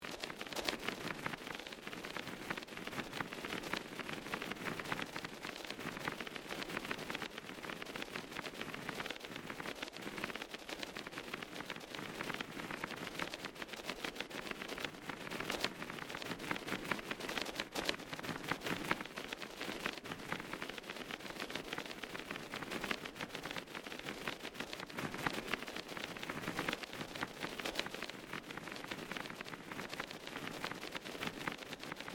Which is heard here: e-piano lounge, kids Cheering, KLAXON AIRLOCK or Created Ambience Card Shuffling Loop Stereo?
Created Ambience Card Shuffling Loop Stereo